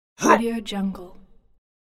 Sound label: orc roar